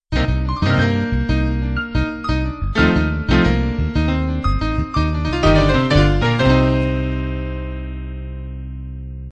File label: piano jazz-pop end